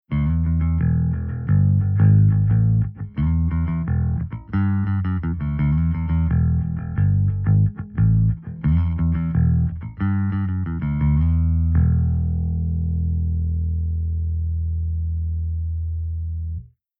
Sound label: SVT LOW HIGH